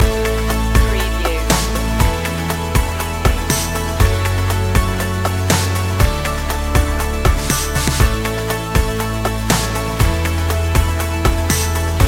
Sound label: sunshine loop